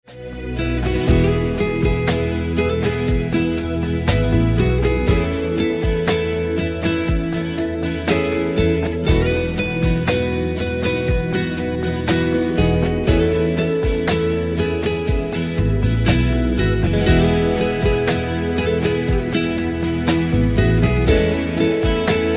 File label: Instrumental